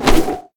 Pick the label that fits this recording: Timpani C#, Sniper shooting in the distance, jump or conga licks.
jump